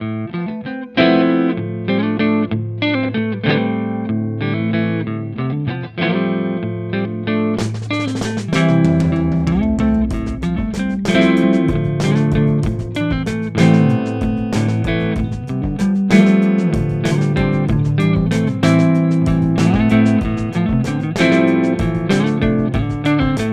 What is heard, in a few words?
Silhouette Neck Clean, guitar; Harmony Guitars